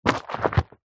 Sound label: household bowl foamy bubbly water swish hand movements